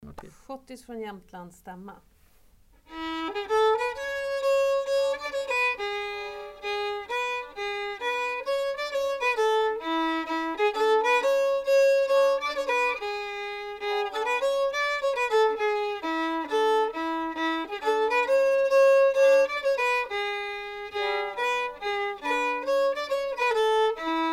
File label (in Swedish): Stämma schottis från Jämtland